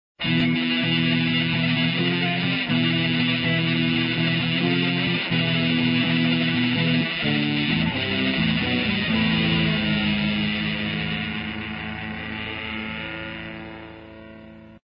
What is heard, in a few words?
Fuzzrite